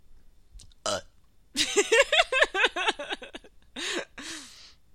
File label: burp